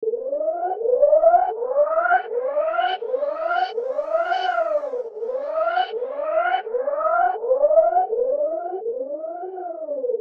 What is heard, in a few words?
scifi alarm alert randomized sound